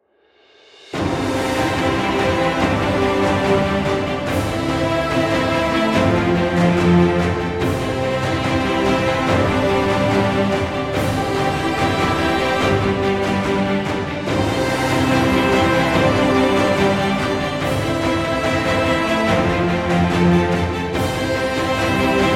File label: Inspirational Sport Epic Music